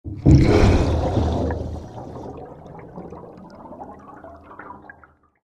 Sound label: Pressure relief when opening a submarine hatch